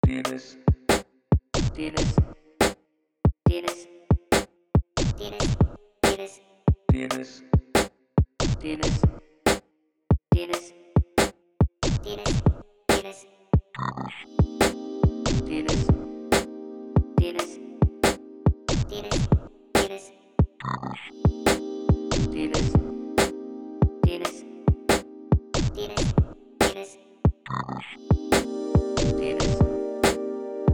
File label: beat